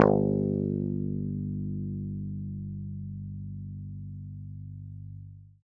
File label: g2 fff, swagbass